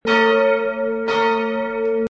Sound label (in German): glocke f1